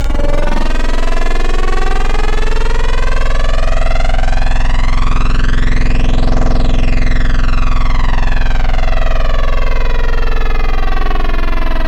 Digital Flanger Talk; Wavetables; Synthetic's Serum Collection; ESW Core Wavetables; Echo Sound Works Core Tables

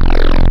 Squelchy, Spectral; Synthetic's Serum Collection

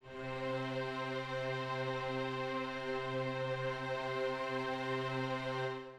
String Ens C Stack; Synthetic's Serum Collection

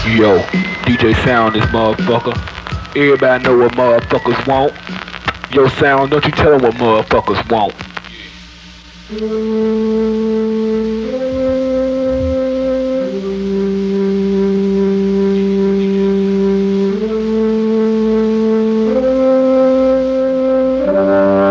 Want Bass Side A Outro

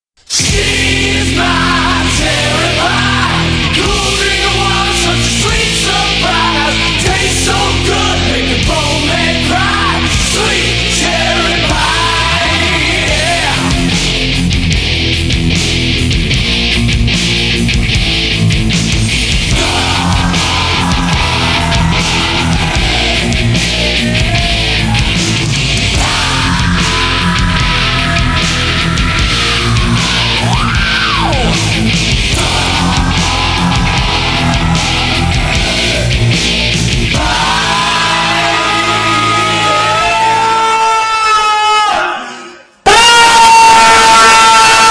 Scream

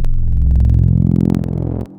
Reese; Digital; Synthetic's Serum Collection

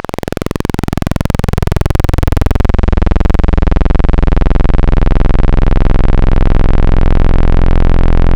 PWM mini; Synthetic's Serum Collection

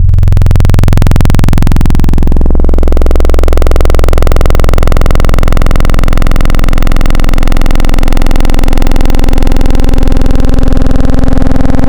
Fm Comb, Xfer Serum Wavetables; Synthetic's Serum Collection; Cymatics - Nuclear for Xfer Serum Wavetables

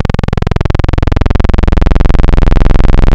PWM; Synthetic's Serum Collection

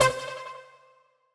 Serum ARP Upside Down; Synthetic's Serum Collection

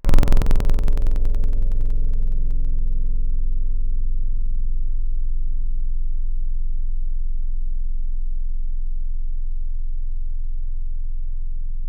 guitar C2; Synthetic's Serum Collection; Aubit - Gray Vol. 1 Serum Tables

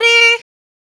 Noises Vocal Shot; Synthetic's Serum Collection; Production Master - Vital Future Bass 4

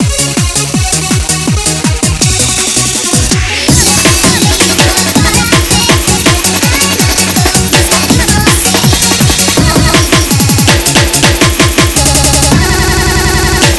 Happy Hardcore; lolicore; HM Records

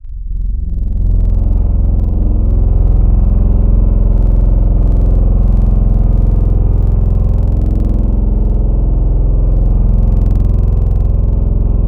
real trombone; wavetables; Instruments; Synthetic's Serum Collection; ESW Core Wavetables; Echo Sound Works Core Tables

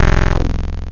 PWM C64; Synthetic's Serum Collection

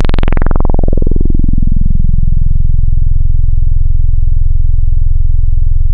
Acid, Analog; Synthetic's Serum Collection

